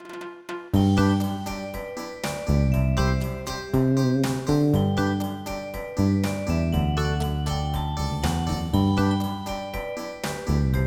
REGGAE

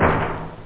bongo; da capo vol1; drums nature

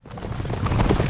horse

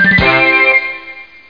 tadahh

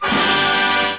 tadaa

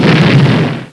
Big Explosion; Cyclone II

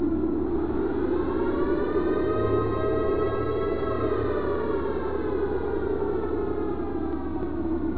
background; Games World; Shareware Games; Native Assault 1.2.1

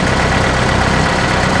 Tank loop; Vehicles; DarkBasicPro

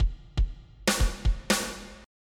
fill

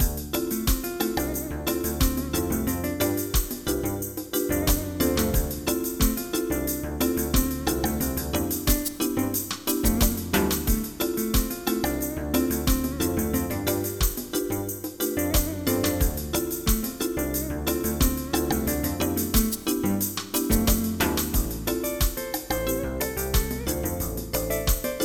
Reggae

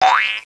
Boing; Princess and the Pea; SoftKey

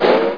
shield on

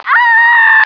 aaagh; stars; taunts

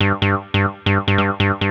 bass; Techno Music Maker

